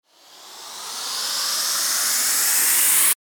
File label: RISER